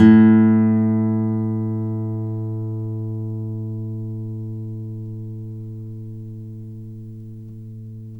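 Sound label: GTR A1 AC; Synclavier Sampler Library; KEYS AND GUITARS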